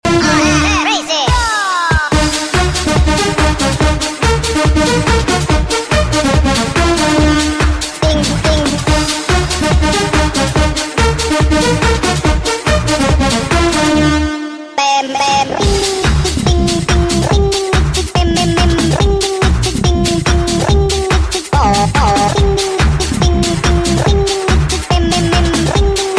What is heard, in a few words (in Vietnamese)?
Nhac chuong vui nhon